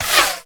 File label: bolt pass by; Mount&Blade Warband